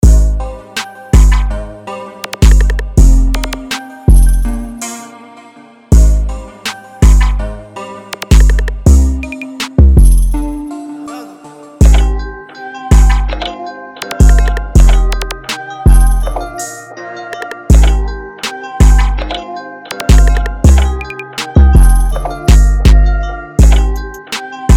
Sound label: 163bpm gmin